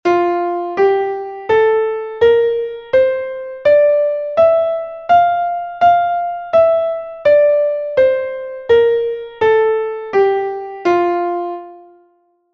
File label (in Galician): escala fa M; Repasamos tonalidades maiores e menores